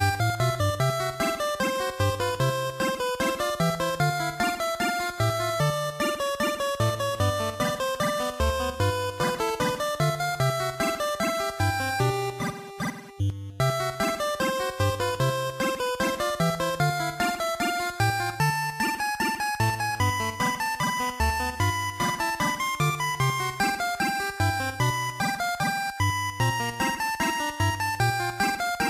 Music Minigame Dance; save me mr. tako tasukete tako san switch windows gamerip 2018

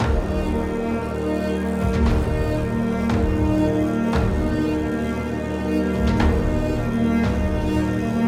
blizzard Intro loop; song of iron

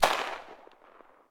Enemy Boss Shoot Start Tail; Smilinguido

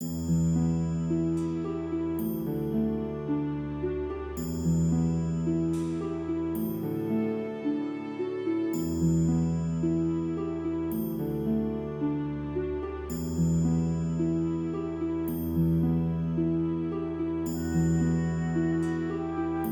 Calm seq; Primordials Battle of Gods; Trakay

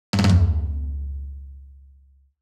win; donkey kong country 2 fan remastered

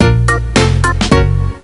Song Lose; Girls Only; NDS

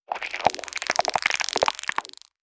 Crab Scream

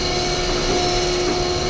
Vehicle Tank Turret Turn Loop

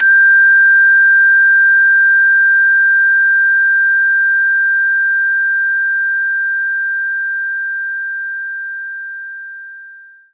Crystal C5

